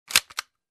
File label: Inserting gun magazine